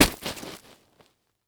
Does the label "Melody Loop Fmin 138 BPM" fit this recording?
No